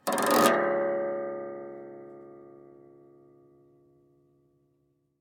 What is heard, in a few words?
Metal Spring Scrape Resonance